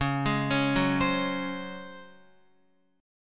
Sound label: Db Major 7th Suspended 4th, Guitar; arpeggiated; JGuitar